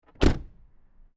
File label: car door close external; spoteffects